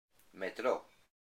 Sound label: GREEK subway